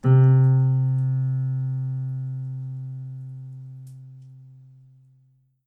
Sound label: piano sample